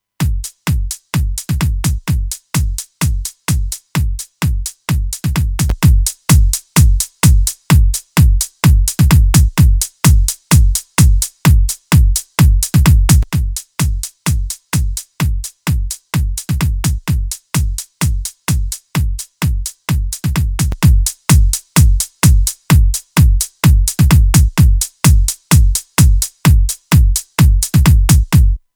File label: Loop Heritage Audio HA-81A Elite On Off